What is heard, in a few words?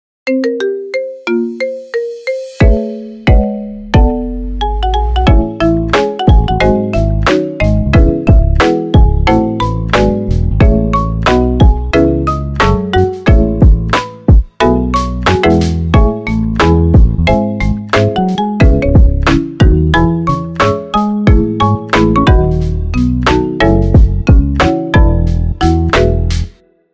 comedy marimba ringtone; spy x family